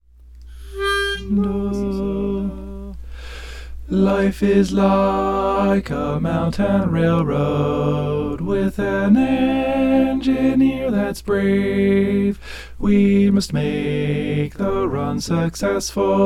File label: tenor